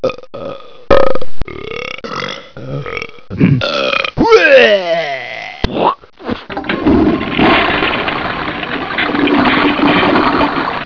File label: barf